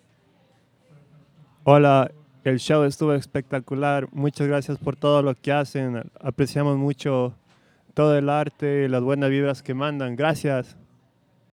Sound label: Audience Recording; a-meal-premiere